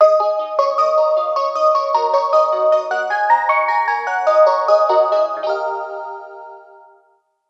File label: Train Jingle; Splatoon 3